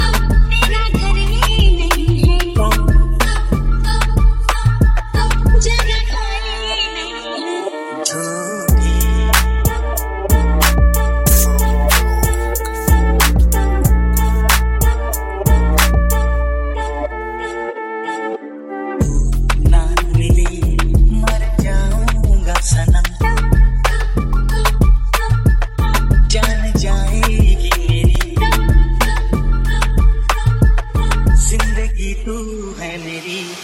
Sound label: Punjabi ringtone